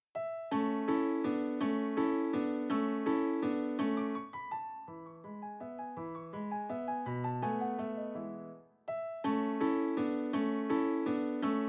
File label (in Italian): Contraddanza versione per piano, re maggiore; Contraddanza per orchestra in re maggiore WoO 8 numero 8